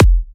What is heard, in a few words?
Electro Kicks